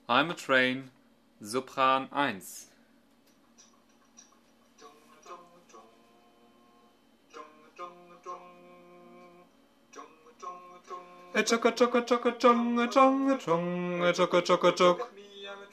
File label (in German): Sopran